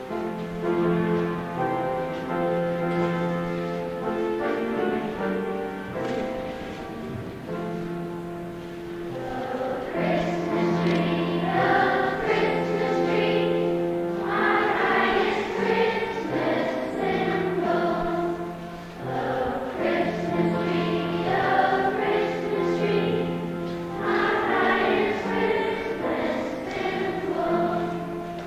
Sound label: O Christmas Tree piano